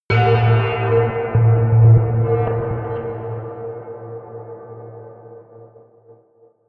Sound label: melodic metal movement low, drones